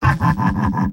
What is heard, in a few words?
pyro laugh evil; vo; tf2; mvm